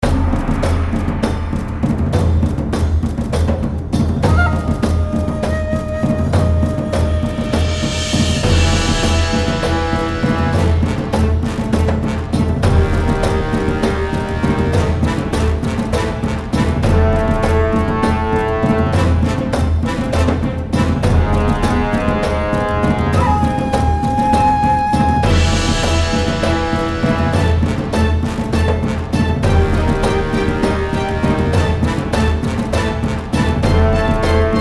War Drums of the Far East

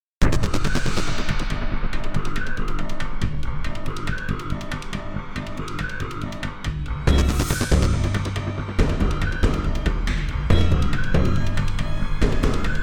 Suspense Intro

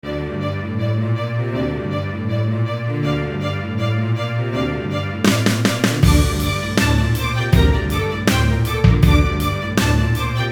Rockin Quartet

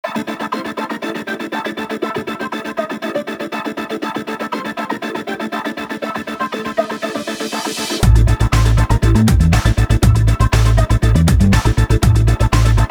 High Tech Suspense Intro

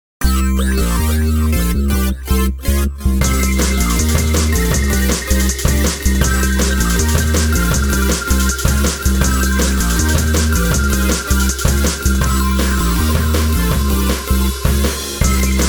Fast Car Intro

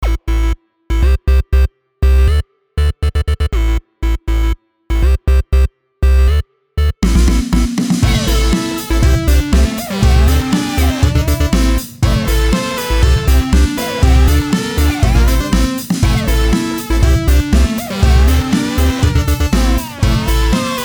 Funky Disco

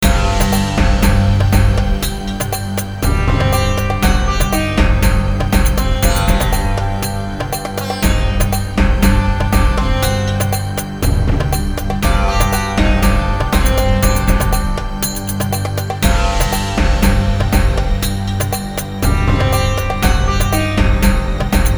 Middle Eastern Suspense Intro

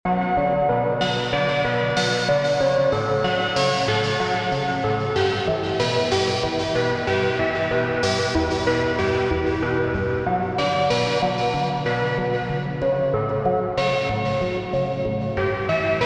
One Synth Loop, Techno

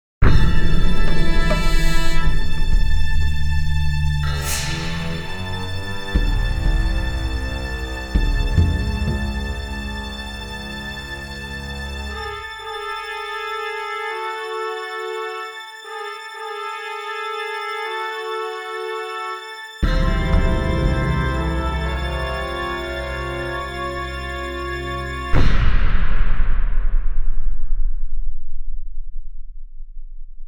Evil Suspense Intro Short

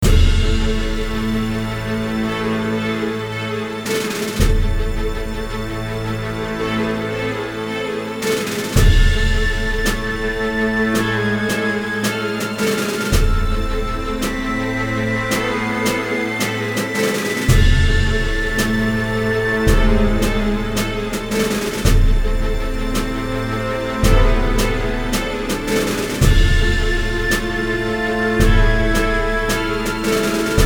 Dark Hero Trailer

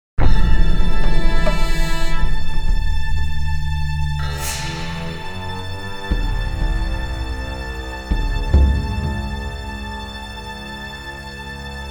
Evil Suspense Intro

